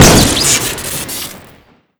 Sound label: shoot crit; weapons; insanerator; tf2classic